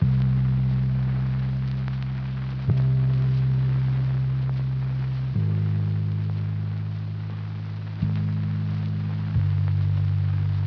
Lofi Melody Loop - 90 BPM C Min Bass; Loop Stems; Cymatics; 2020 Melody Collection

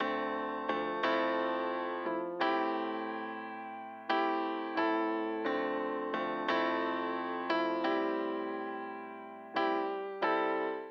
Classic Melody Loop - 88 BPM A Min Keys; Cymatics; Oracle Sample Pack

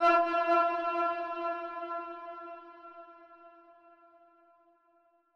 130bpm Am, Vocalpack

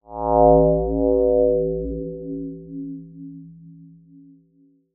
Pad; Dubstep

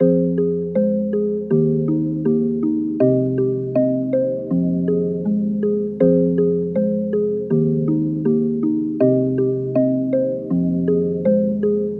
160 BPM F min Bells; Loop Stems; Cymatics Gems Vol 3; Gems Free Teaser Pack; Memories

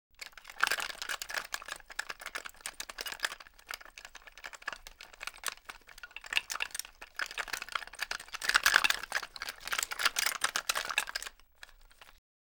Pulley; Fairlight Sound Lib

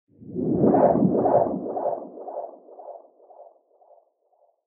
Whoosh; Whooshes

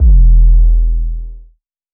808 - E; 808s; Cymatics; Cobra Hip Hop Sample Pack

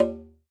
Conga - D#; Percussion; Cymatics - Infinity Beta Pack 2.0; Zeus Percussion Collection

